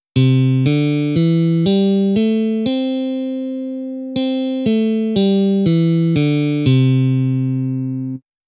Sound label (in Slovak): stupnice elektricka gitara pentatonika